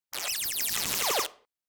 Beeps Sci-Fi Space Machine Beep; Hollywood Edge; sound designer's toolkit v2

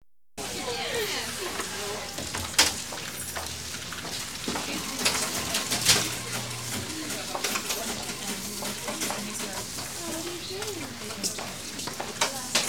Audience Applause

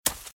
Footsteps Leaves Sprint Left; RadwinIsland

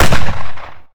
Gun Pistol Shot; SFX Kit; Weapons

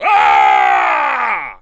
male screem; firefight

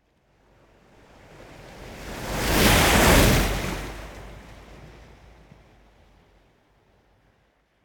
Ocean Strong Wave On The Coast Surf Water Lap; Hollywood Edge; sound designer's toolkit v2

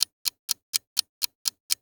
Timer Clock; Clocks, Watches and Timers; Blastwave

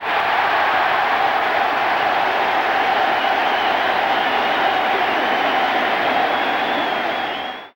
Baseball Crowd Cheer; SFX Kit; Crowds

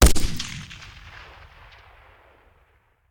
Machine Gun; Sound Ideas; Guns

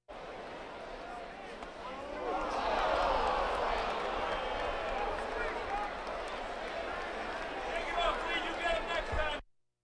Crowds Race cars Baseball

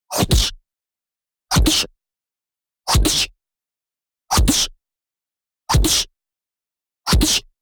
PUNCH Lead In High Punch Slap; boom library; Anime Essentials

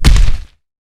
Creature Footstep, Horror Effects

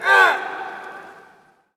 Hockey Male Hurt; SFX Kit; Hockey Vocal Sounds

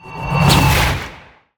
Ice Spell Mobility Teleportation Glacial Glide; Mobility & Teleportations; Arctic Aura

